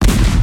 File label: cannon; RS Games Sounds; Monopoly